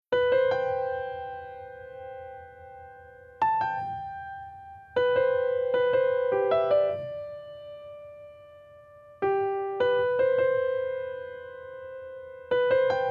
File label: FutureBass Mix Melo Piano Comp On